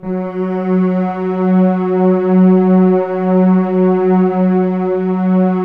F#3, Keypad; Standard Instr; Keypad 1

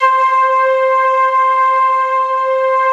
ST ORG, STRINGORGAN, Yamaha VP-1

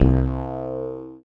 STRANGE BASS; Masterbits; Dance Trax

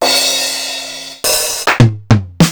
Sequential DrumTraks Tom

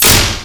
zap, Emu Sp12

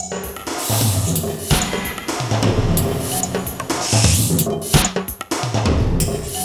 120 NEON FX 1, Industrial Loops

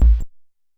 Kick Lo, Stylophone Beatbox

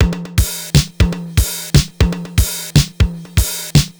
TR 505 (120BPM), beats; musicradar; cyborg beats

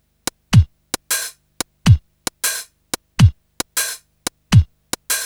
Kick Hat, Drum & Bass; Construction Kit

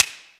SNAP, Ragga & Dub Reggae; PERCS KIT; USB Soundscan vol.27; AKAI

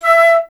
FLT FLTE, Flute; Miroslav Vitous - Solo Instruments VOL-2; Solo lnst. II

